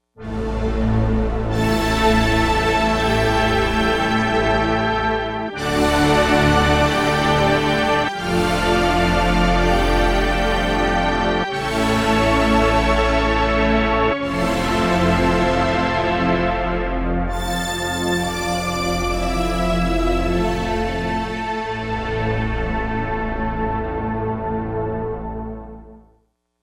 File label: Chime Octave; Digital Synthesizer